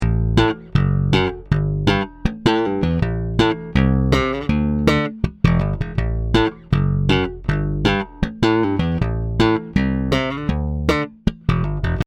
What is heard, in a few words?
slap, bass; harley benton ssb; 10 1 harley benton ssb